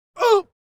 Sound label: male grunt punch; Grunts; Butterfinger Video Sound Effects